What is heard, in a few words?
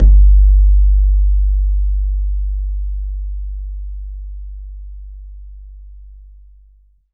BAYAN, Drums Percussion; E-MU Producer Series Vol. 4 – Denny Jaeger Private